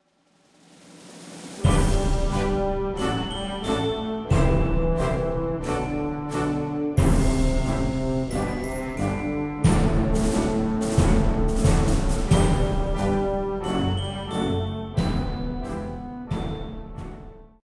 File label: Jingle Congratulations Quiet; Jack Keane